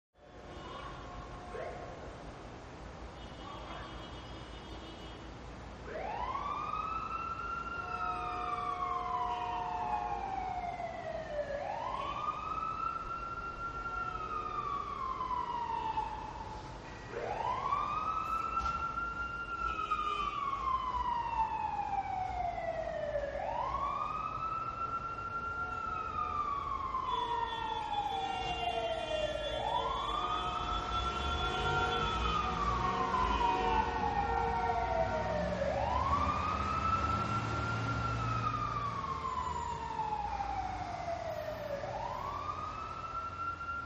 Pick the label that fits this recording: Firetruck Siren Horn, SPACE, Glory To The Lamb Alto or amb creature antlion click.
Firetruck Siren Horn